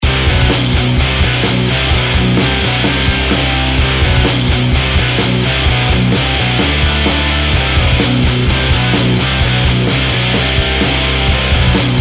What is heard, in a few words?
neorock, bgm; maoudamashii